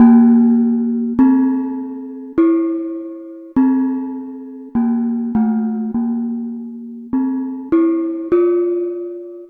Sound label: Pelog Unison Bonang; Melodic; Ambient-Melodics-II